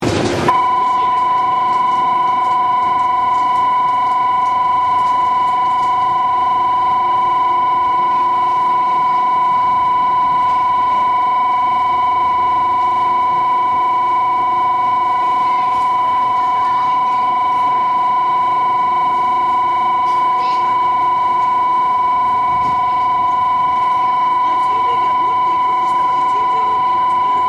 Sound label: hassya; gotemba